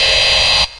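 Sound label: Yamaha RS7000 hihat cymbal, Drum machines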